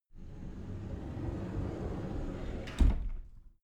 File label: cupboard slide door open soft